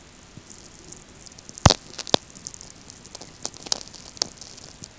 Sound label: VLF; StMichel